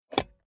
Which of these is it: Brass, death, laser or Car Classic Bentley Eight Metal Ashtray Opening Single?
Car Classic Bentley Eight Metal Ashtray Opening Single